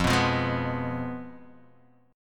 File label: F Diminished F down; Fdim strummed; JGuitar